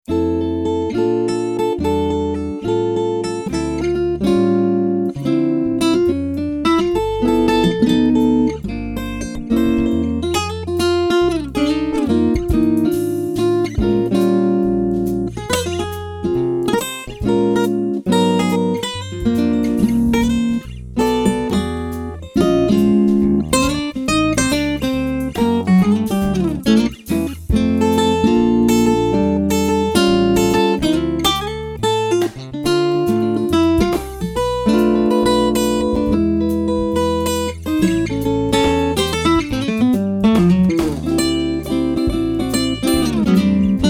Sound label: Jazz Guitar